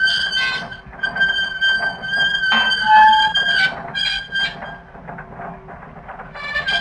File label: chamber; Mafie 1